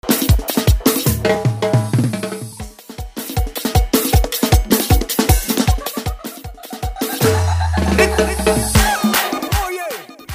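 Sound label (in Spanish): transicion intro animacion 155 118 bpm; banda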